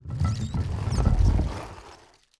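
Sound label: siege initial movement; Onager, Ballista, Ram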